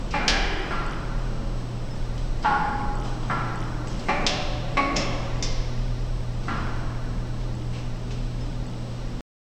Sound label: dark ambient soundscape, underground